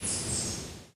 forest bird, ambient; Source Engine; tf

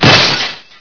impact glass, debris; Source Engine; bshift

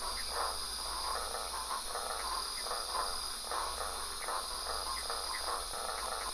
pond life; Source Engine; tf

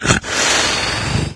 zombie pain; Source Engine; video game; npc

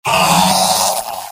pain severe; engineer; video games; source engine; tf; mvm

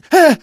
hurt minor, survivor voice, manager

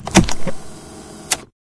launch switch push; video games; ambient; source engine; portal2; outland

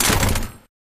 item heavy gun drop

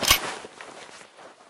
pistol slide back; Left 4 Dead; Source Engine

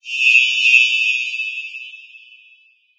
amb random tech; video games; ambient; random abstract; source engine; portal2

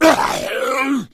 hunter pain

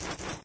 taunt shotgun twirl; video games; source engine; tf

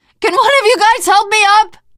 ledge hang middle, teengirl; Left 4 Dead; Source Engine; survivor

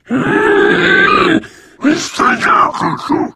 charger lurk, voice idle; player; source engine; left 4 dead 2

